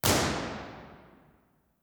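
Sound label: room impulse response, concert hall; jack lyons; university york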